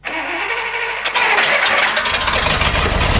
ford A on; Mafie 1

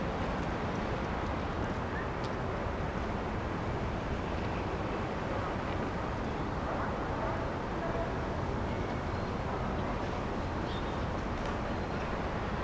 ambi town; Mafie 1

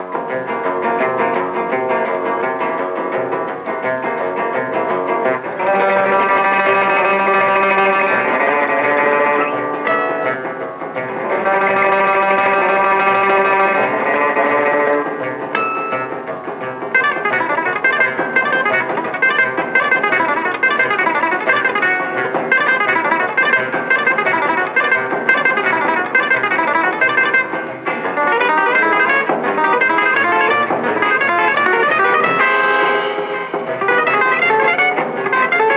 music; Mafie 1

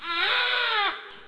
baby; Mafie 1

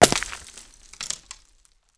wall; Mafie 1

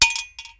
metal; Mafie 1

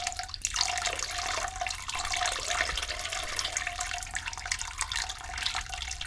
piss; Mafie 1

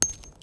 gravel; Mafie 1

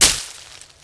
bush; Mafie 1

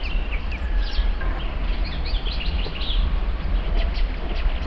bird; Mafie 1

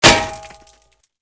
metal; Mafie 1

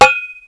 metal; Mafie 1

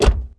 close; Mafie 1

